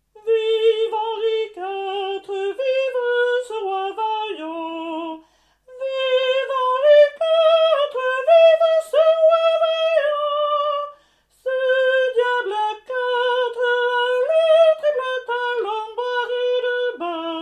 soprani

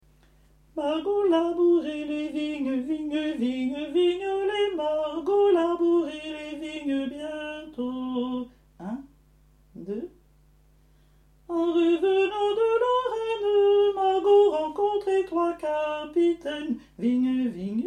margot labourez les vignes alti

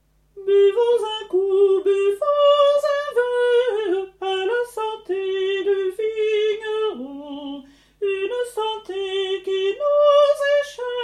soprani; a la sante du vigneron

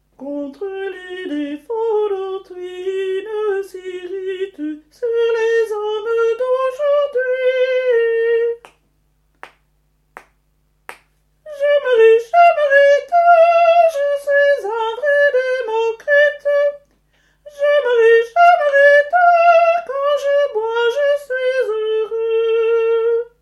contre les defauts dautrui tenors

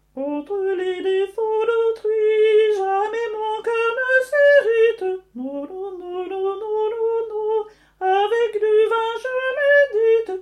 soprani

